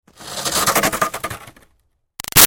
Snow Scrape Shovel By Gritty

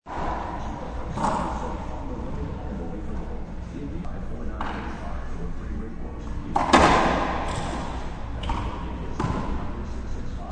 Racquetball game in gym with TV in background; Racquetball Hits; Racquetball (Sound Effects:Sports)

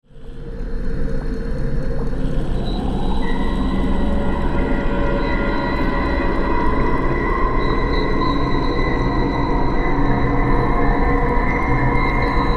Synth, Stab, Drone, Horror, 5.1

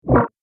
Multimedia Rollover, mouseover sound

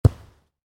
Volleyball (Exterior): Bounce On Grass; Miscellaneous Sports; Stuart Duffield

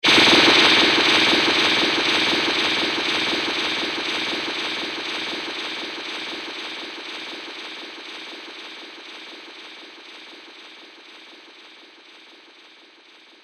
Sci-fi Horror Liquid Splatter Echo

